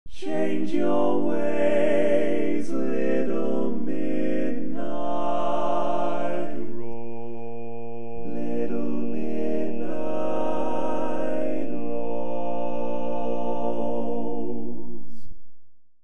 Lead, barbershop

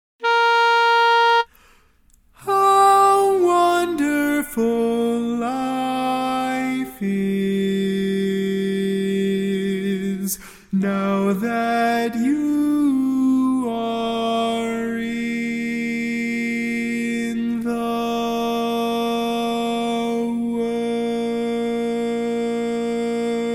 Lead, barbershop

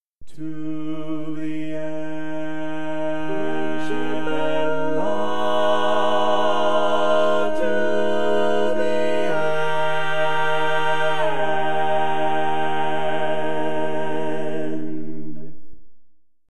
Tenor, barbershop tag

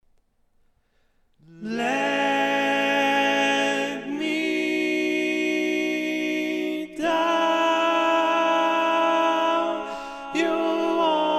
Lead, barbershop

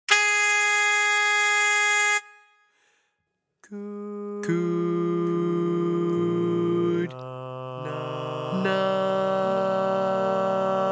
Lead, barbershop

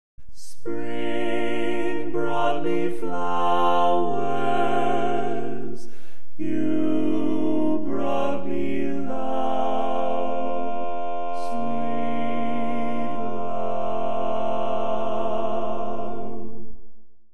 Tenor, barbershop

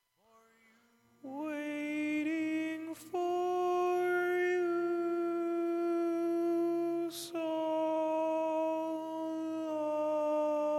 Tenor, barbershop tags

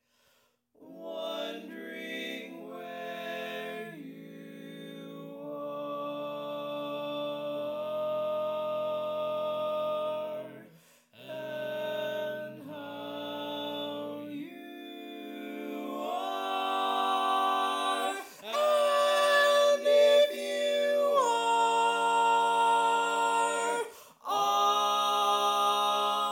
Tenor, barbershop

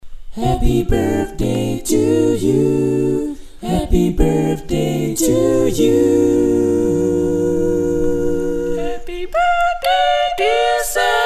jazz All Parts, barbershop tags

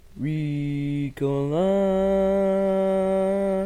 Bass, barbershop tags

